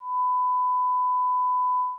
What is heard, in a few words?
1Khz; acustica